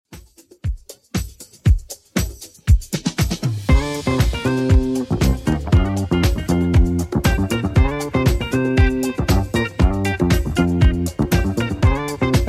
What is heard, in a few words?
70s Redrum 118 bpm